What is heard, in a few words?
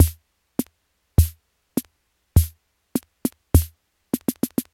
foxtrot, rhythm; Omnichord; OmnichordGodot